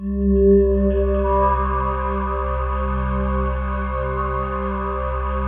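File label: PAD, atmospheric pads; Best Service; XX Large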